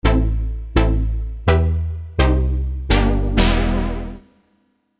Stab Huge; tech house; SERUM

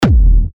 Kick F; TECHKICKS1

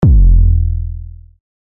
808 Kick E

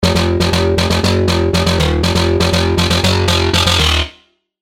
Bowed Metal; SERUM ULT BS

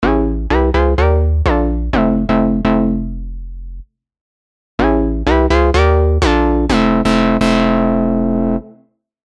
Stab Mello; tech house; SERUM

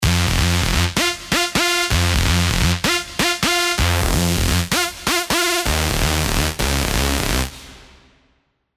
Underwater

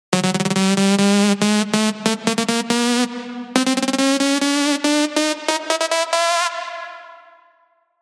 Tonal Risers (140 BPM) 4bar F

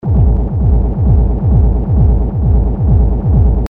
Kick Rumble Loop (132 BPM); TECHNO

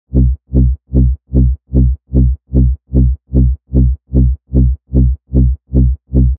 Reverse Bass Loop C (150 BPM)

